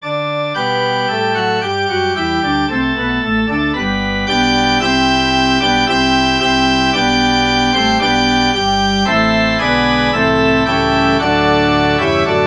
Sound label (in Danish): forspil; ddk 254 i sten stiger